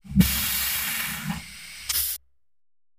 Hydraulic valves release air and steam. Valves, Release Air, Pressure Hiss, Steam